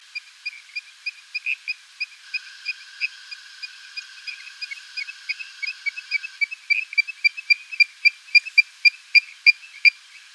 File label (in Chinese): 面天樹蛙